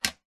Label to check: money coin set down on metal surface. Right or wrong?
Right